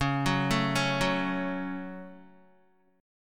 Db Suspended 4th Sharp 5th Guitar 4E,4A,5D,5G,5C,6F; arpeggiated; JGuitar